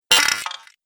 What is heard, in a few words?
Droid Digital Part, robot; Robot Sound Effects